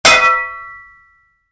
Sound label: Spade hit, Shovel, Impact